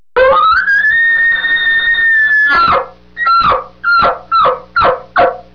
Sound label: Elk Call